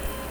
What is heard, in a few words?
call syl, Sounds, Syllables